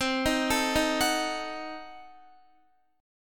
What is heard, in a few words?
C Diminished 7th, Guitar, chord; arpeggiated; Cdim7; x,15,13,14,15,13; 4E,4A,5D,5G,5C,6F